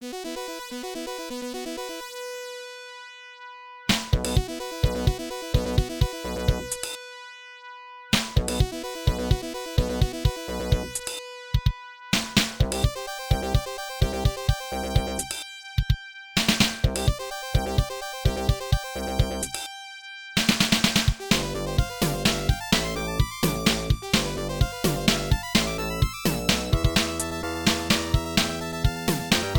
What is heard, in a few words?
Freezing brass; XZR II; Telenet Music Box Sound Board II